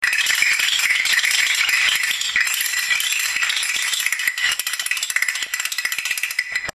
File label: castonettes